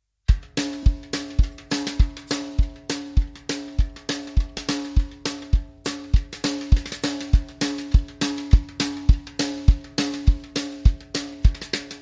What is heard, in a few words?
Down Home Bass